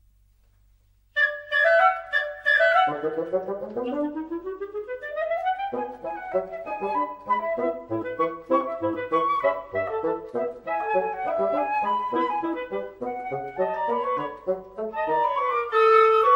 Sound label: Divertimento for flute clarinet and bassoon